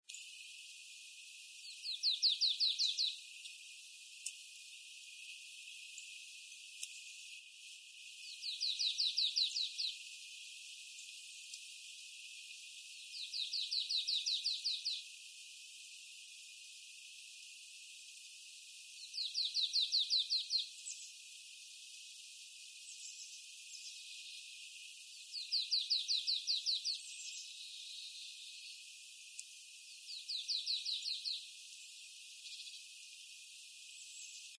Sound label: Parpal, tiaiset, palustris; Laulu / Song 24.2.2002 Ganghwa, Korea; Linnut